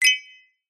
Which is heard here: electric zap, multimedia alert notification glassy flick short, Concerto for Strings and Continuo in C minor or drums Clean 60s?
multimedia alert notification glassy flick short